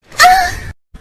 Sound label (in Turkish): Anime zil sesi mesaj sesi bildirim sesi alarm sesi